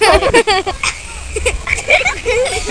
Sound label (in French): rires; MOBICLIC; langues